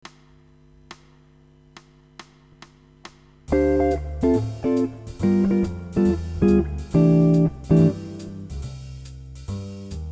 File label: Mixed with Inversions, guitar